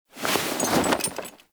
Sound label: Camp Canopy Flap; Quests; Veilbreaker; Kahl Camp